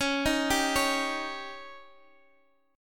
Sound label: Db Suspended 2nd Flat 5th, Guitar, DADGAD